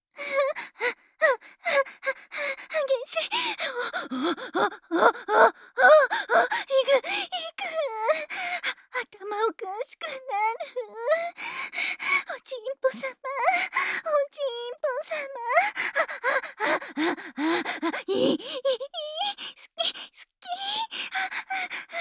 あぁん♥あっ♥あっ♥あっ♥

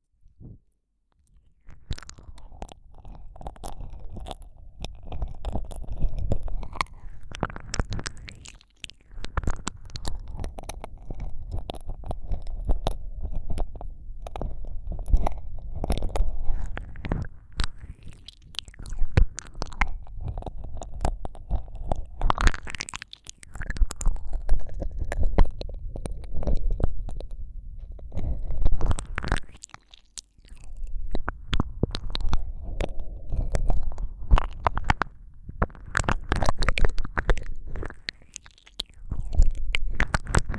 舌を奥まで差し込む耳舐め

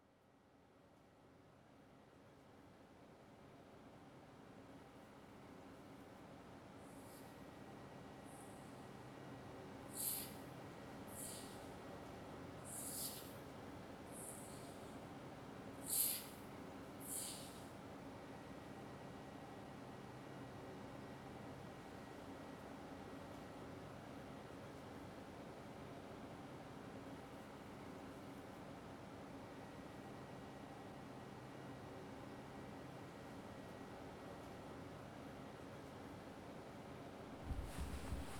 耳かき（右）ASMR; ご奉仕らぶらぶ耳かき（右）

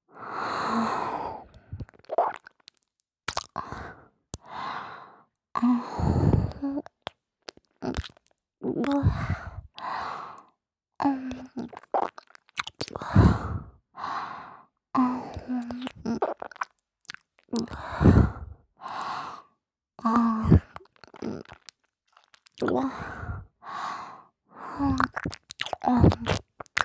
あーむ…あむぅ…, ASMR

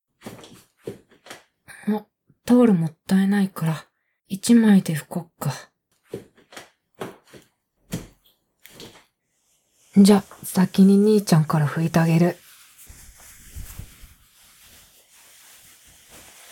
タオルで拭く

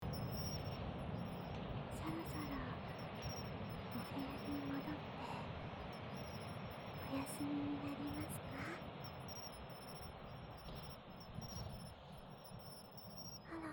みみかきです

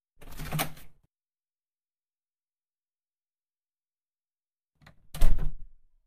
右耳の耳毛剃り（SEのみ）, ASMR

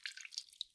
くちゅ 中; くちゅっ 短め

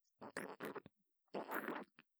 くちゅくちゅ, ASMR

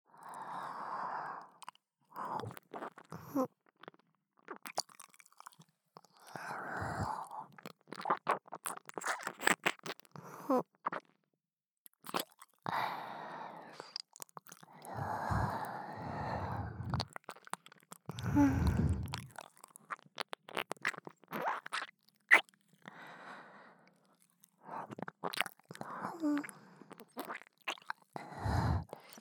耳なめ（左）

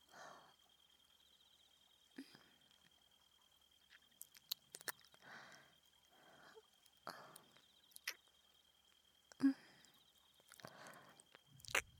右耳舐め, ASMR

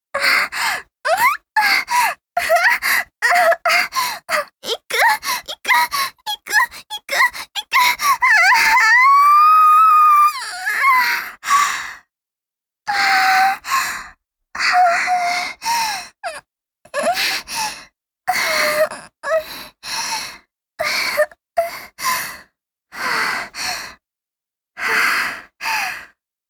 絶頂の喘ぎ声, ASMR; チュパ音抜粋集

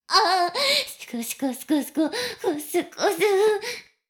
しこしこ音声, ASMR; 少尉; 牧場戦腺

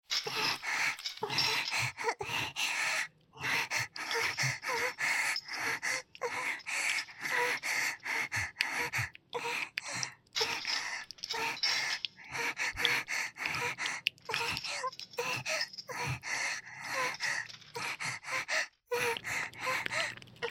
拘束しながら愛撫

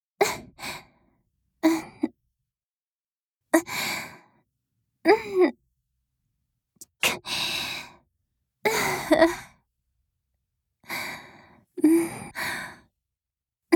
マットプレイ時の息漏れ

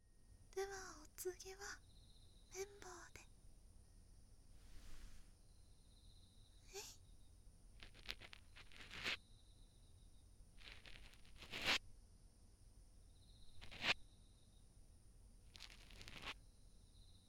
右綿棒, ASMR; お隣さん夏

